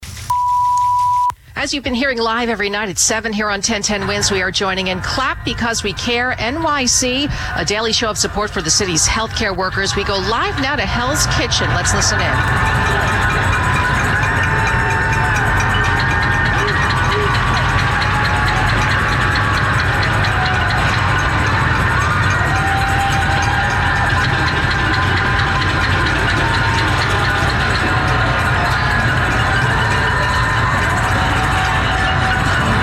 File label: clap